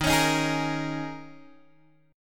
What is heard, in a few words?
D sharp 7th Suspended 4th D sharp, Guitar Open G